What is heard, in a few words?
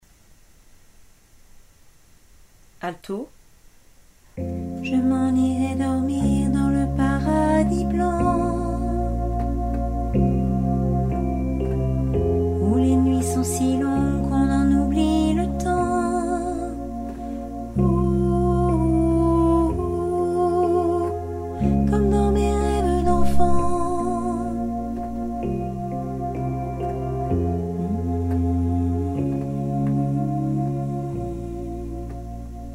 alto